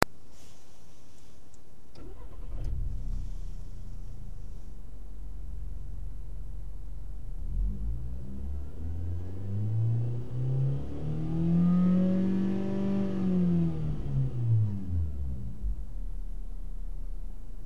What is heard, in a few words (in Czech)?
motor in, golf